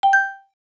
operation sound; Whalebird

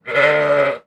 sheep; rssguard

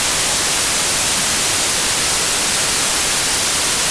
white noise; OpenValley

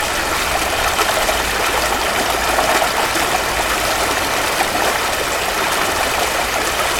water stream; OpenValley

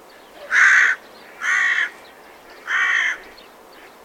raven loop; environment; OpenValley

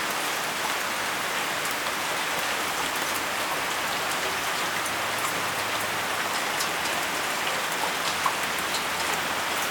moderate rain; OpenValley

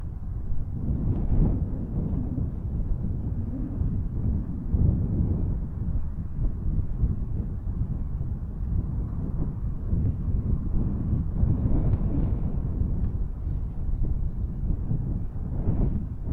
soft wind; OpenValley

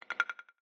bookmark; IceCubes; Mastodon

